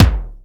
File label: Punchy Kick; Drums; Kicks